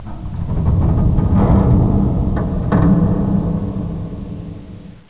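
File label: metal creak; de Nostromo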